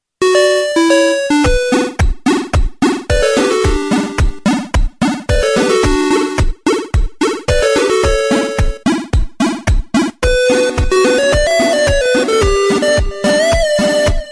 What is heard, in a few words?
8 bit